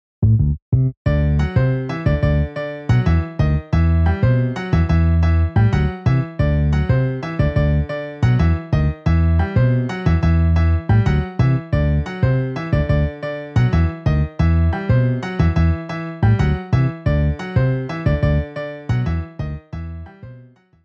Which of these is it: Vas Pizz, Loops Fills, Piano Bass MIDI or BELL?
Piano Bass MIDI